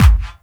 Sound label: KIT, Dance Mega Drums; Best Service